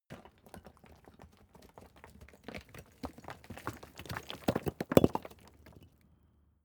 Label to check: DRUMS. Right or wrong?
Wrong